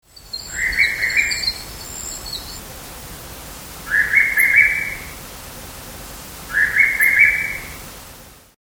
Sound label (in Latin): pitta iris, ptaki; kurtaczka tęczowego; kurtaczki bronią swojego terytorium demonstrując pokaz siły na krawędziach rewiru, głośno przy tym krzyczą